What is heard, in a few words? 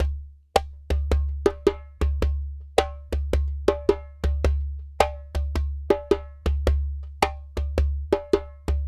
Djembe; Sonic Foundry (Sony Creative Software); Continental Drift Aftershocks